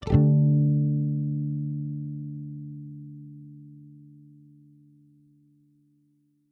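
electric guitar custom strings stroke back quick strong muted dense short decay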